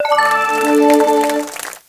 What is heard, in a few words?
Sfx Computer Retraining Successful